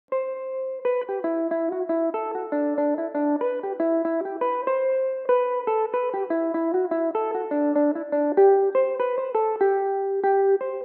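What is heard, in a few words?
G major, irish mandolin, tunes; is it silk thats in your bag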